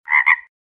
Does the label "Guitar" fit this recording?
No